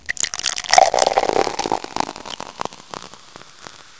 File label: pour